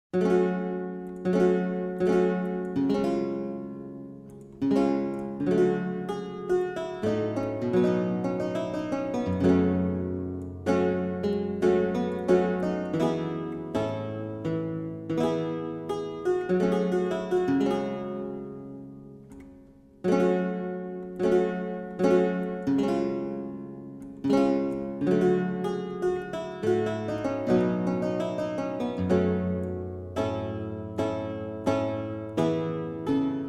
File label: German Lautenwerck; Wenn ich so denk; Anonym